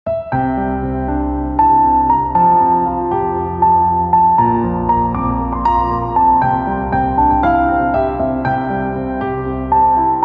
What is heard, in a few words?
Piano, instrumental ringtones